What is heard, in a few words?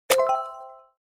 spin, slot; Brazil; slotox